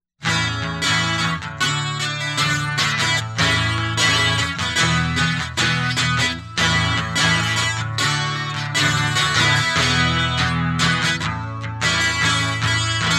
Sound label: Guitar; hyper terrestrial